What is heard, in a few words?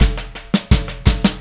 Static Beat Intro; Sonic Foundry (Sony Creative Software); Indie Rock Anthems; Session 13 - Static